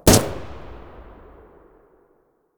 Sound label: sa80 muzzle mono distant, weapons